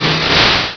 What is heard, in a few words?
Cry; Pokemon Iberia V1.03; Pokemon Essentials